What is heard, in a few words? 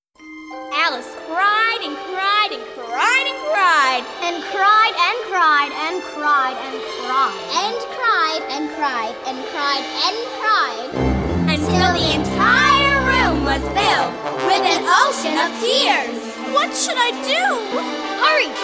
Guided Vocal